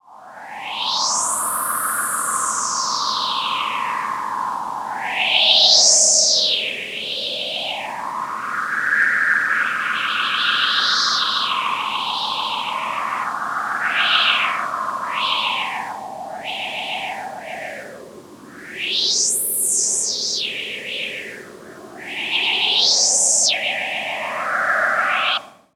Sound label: wind; 主播女孩重度依赖